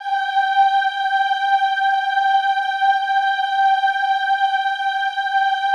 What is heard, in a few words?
OOH G4, Classical Choir; Best Service; Extended Classical Choir